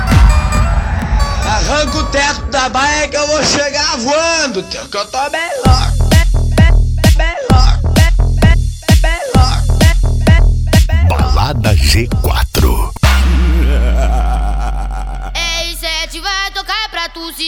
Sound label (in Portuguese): hip hop mega funk